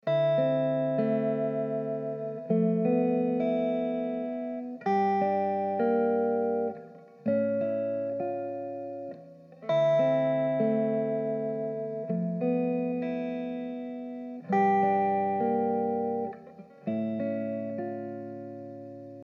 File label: Dm100 Jazzy GTR chords